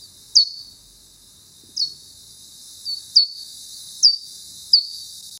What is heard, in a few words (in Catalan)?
pipistrella comuna, sons ratpenats; ratpenats plafons